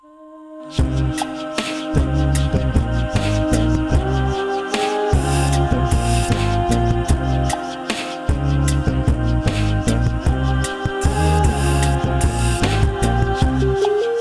intro Percussion Test

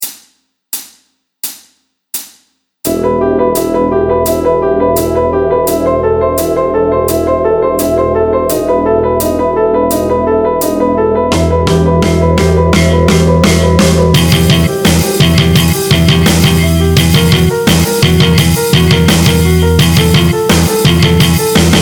Neoclassical Metal Guitar Licks Backing Track

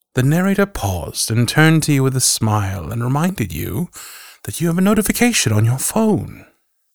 Ringtone; The Narrator

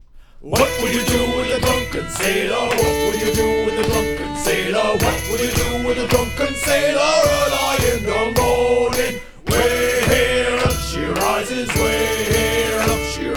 Drunken Sailor Shanty

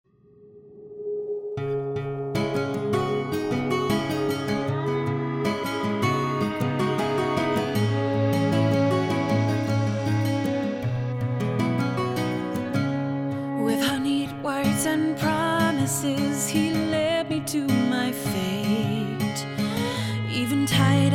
Theme Vocals

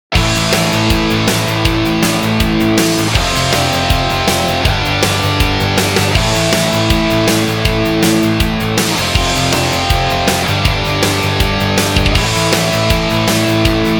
Backing Track

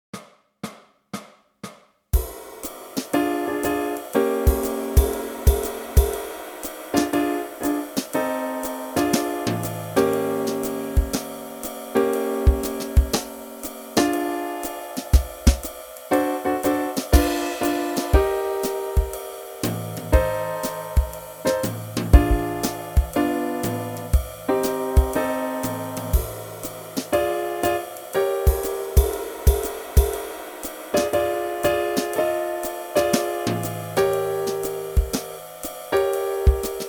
Blues in Bb - Backing Track

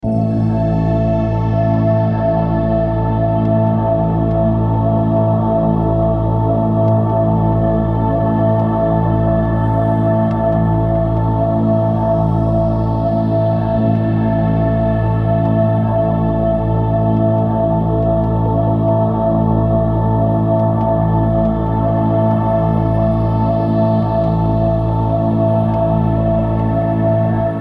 Arabic Scale Backing Track

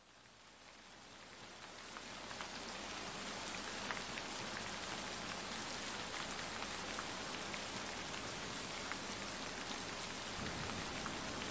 Rain Sounds; Get Instant and Permanent Results Updated; Uplifting Energy

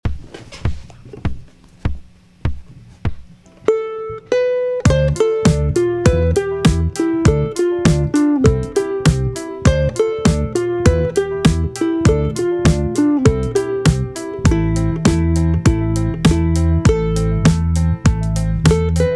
Backing Track

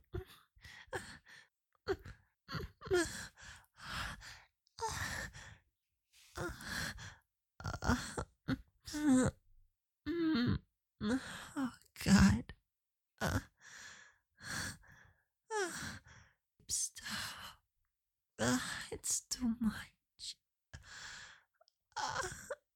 moany

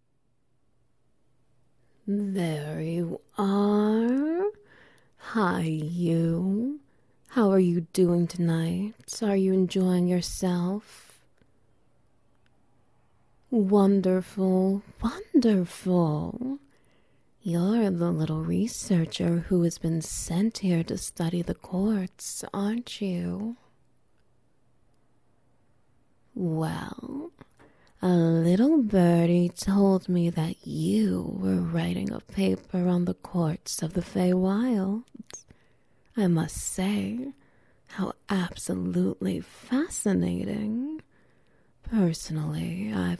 Wet Noises

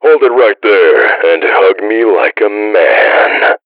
hug

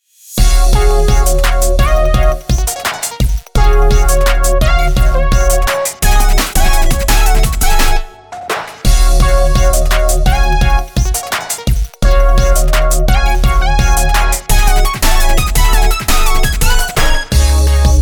Dub; Kitchen

